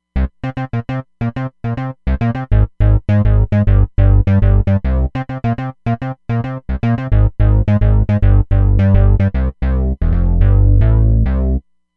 Reso Syn Bass

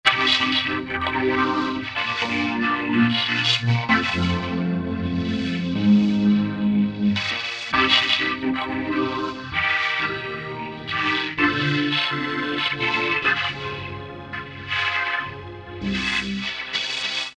Sfx Vocoder, Alesis Micron; Factory Programs

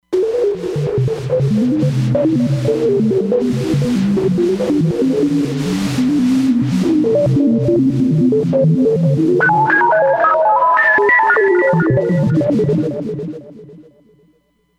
Mod Scanner, Roland Fantom XR

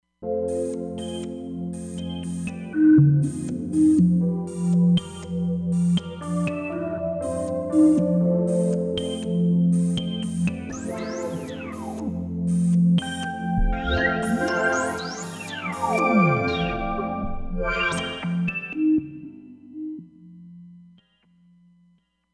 ResoStep, Roland Fantom XR

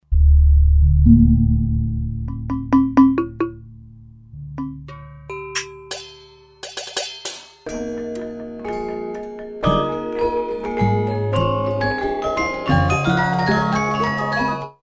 Gamelan, Roland SR-JV80-14 World Collection Asia

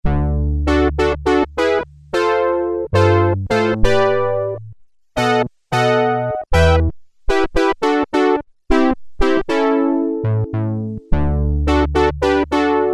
Brass, Roland Juno-106; Factory Preset

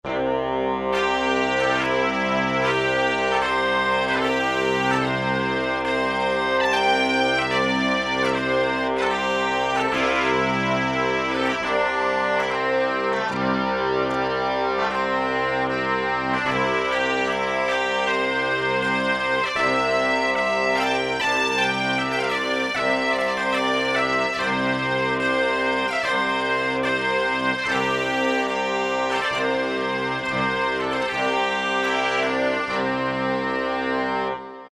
Korg T3 Bag Pipe

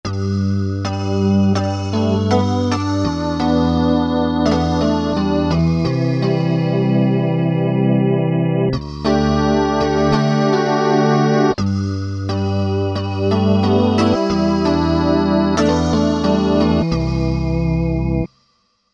Sand Org, Korg MSC-14S Sound Effects

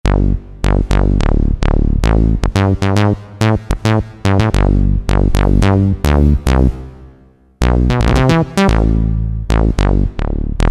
Synth Bass, Roland SC-50 Sound Canvas; Factory sounds

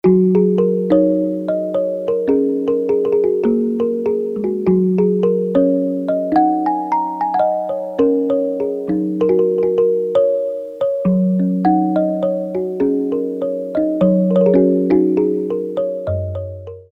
Kalimba, Ethnic Perc, Korg DSS-1

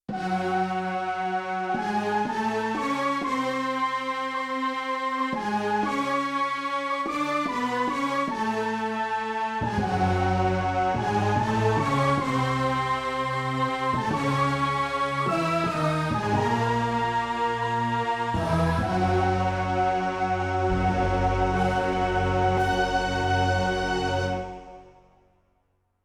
Unisono, Yamaha TX16W; Orchestral Performance 1

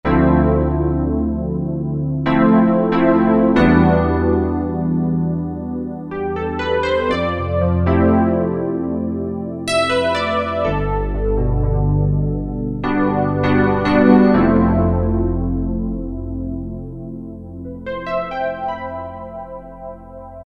Piano Magic, Korg N364; Factory Prog demos

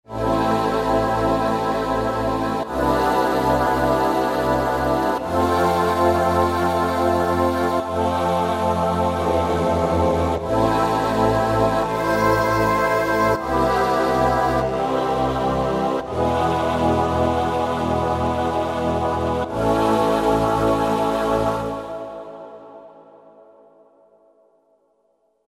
V-Choir, Roland V-Synth; Factory Patches

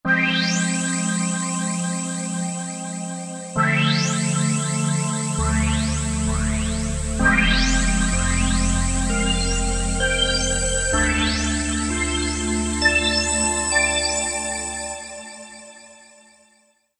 Trance Chime; Roland SR-JV80-11 Techno Collection

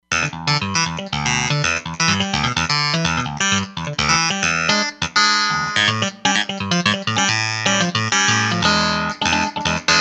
Over D6

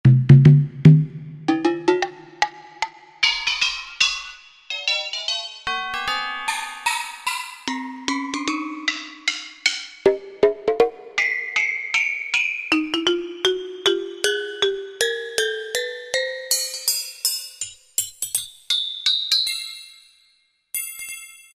Tuned Drum, Korg T3

